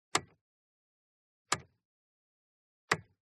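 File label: SPORTS CAR Interior Lightswitch; TRANSPORTATION; smartsound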